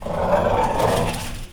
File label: DOG; 3D Sound - 3D Keys VOL-1; MEAN 3D